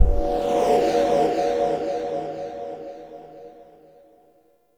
Stinger B; Leverian Dante; Warframe; Vitruvian Museum

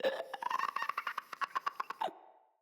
Infested Runner Patrol

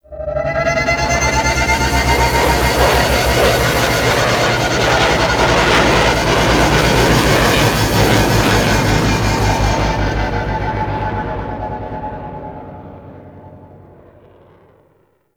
Extraction Depart, Flight; Vehicles; Warframe; Liset; Nora Liset

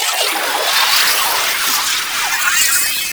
Strike Target; Warframe; Trapper

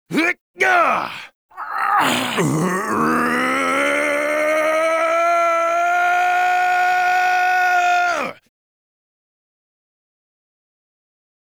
Tank Finisher; Arthur; Warframe; NineteenNinetyNine; Cinematics

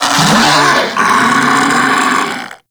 Kubrow Armored Vocal Death; Warframe

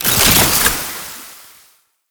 Alchemy Grenade Pickup Toxin; Entrati

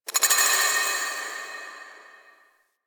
Moon Clockwork Texture Small H; Ambience; Warframe; Orokin Moon

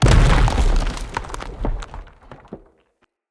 Arena Rock Fall Impact; Bromius; Abilities

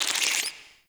Insect; Alchemy Lab; Warframe; Hub; Liset

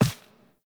Zariman Hub Apartment Foley; Warframe; Cinematics

